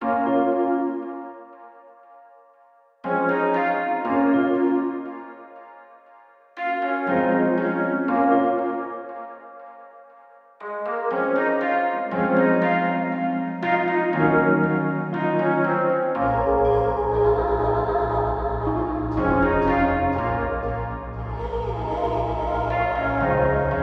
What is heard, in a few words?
Leisure Melody 119bpm Bbm